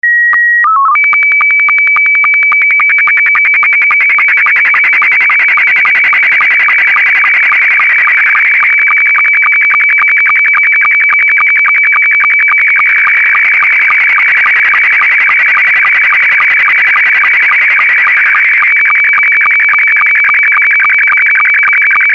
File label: sstv analog s2